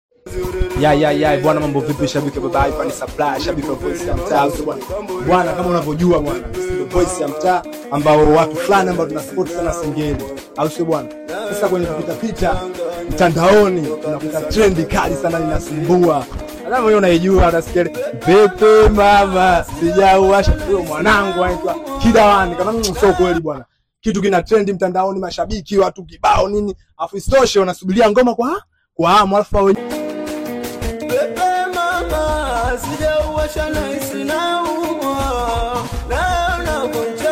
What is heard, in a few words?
singeli